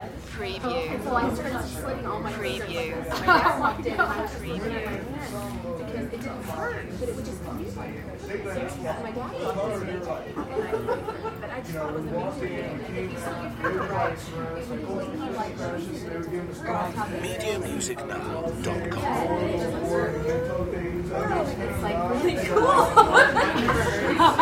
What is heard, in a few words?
Cafe Diner Crowd, Various People Chatter Walla, Southern Nashville Tennessee